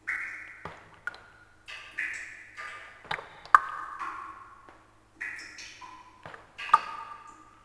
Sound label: drip; generic; ambient